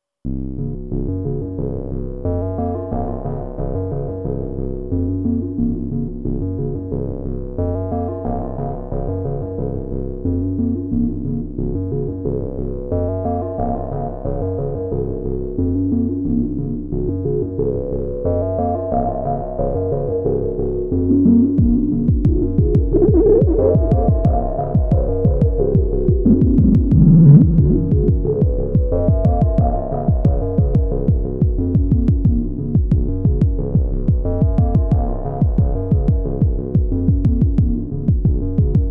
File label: december noise